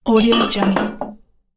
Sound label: glass jar manipulating; crisstyna